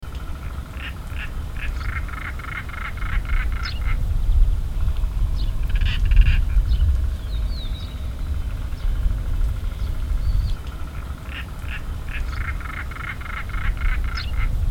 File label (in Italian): Ghiandaia marina Coracias garrulus; Capitanata maggio 2013